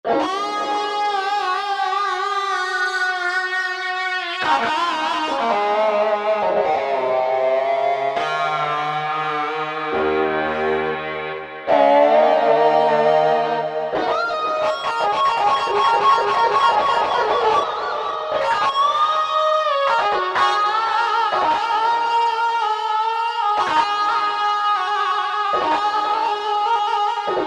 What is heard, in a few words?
Midi Gtr; Generalmusic S2R